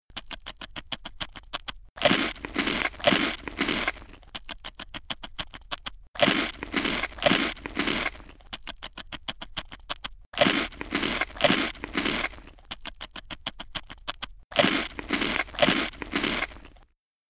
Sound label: rhythm BGM